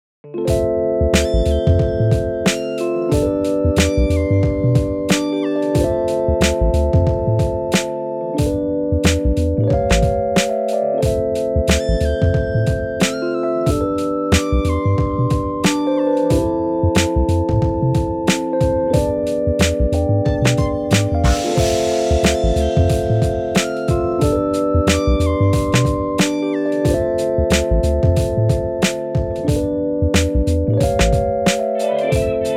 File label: Beat 91 BPM